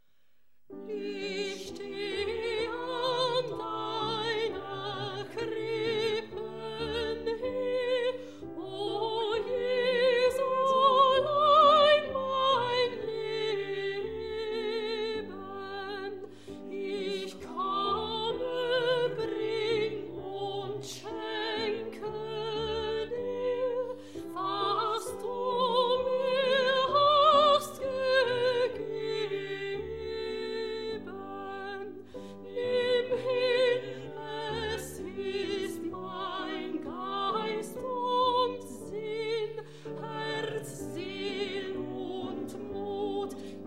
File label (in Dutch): sopraan; Koorproject Weimar Halle en Leipzig; Ich stehe na deiner Krippen